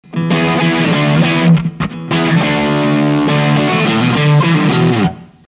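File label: 4558, HARD, NJM4558D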